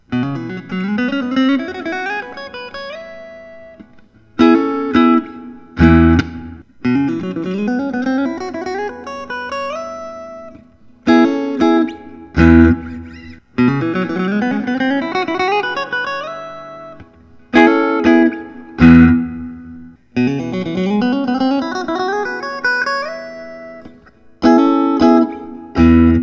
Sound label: fender custom shop 1958; john page era